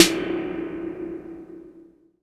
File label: SNARE Tune, 808; Polyend; Stazma & Concrete Collage; Concrete Collage Drum Kit; Spring808